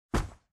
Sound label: close inventory